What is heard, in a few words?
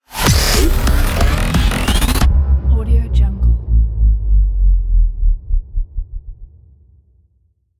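cinematic rising stutter; soundmorph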